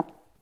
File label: tile step; Golden Crayon Sounds